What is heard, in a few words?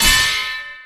metal